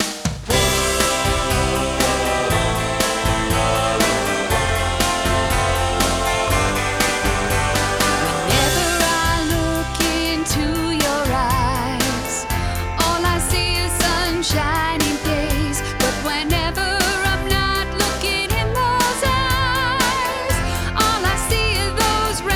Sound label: Female